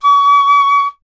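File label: FLUTE PICCOLO C#; Miroslav Vitous - Solo Instruments VOL-2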